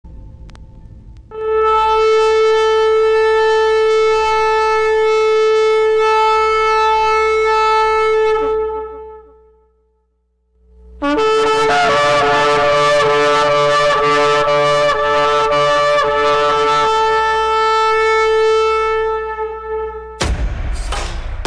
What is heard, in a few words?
Shofar